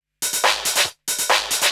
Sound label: TECHNO PERCUSSION 140